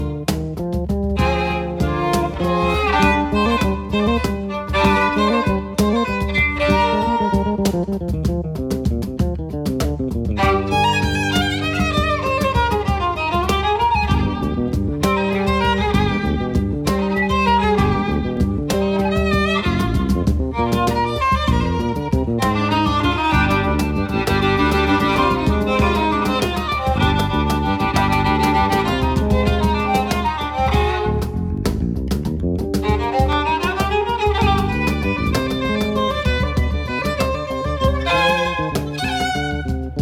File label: Bulerias